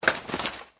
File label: mantis land; npc